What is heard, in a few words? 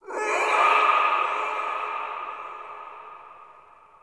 Interior Texture Painful Screech; Ambience; Misc Indoor; Warframe; Infested Eris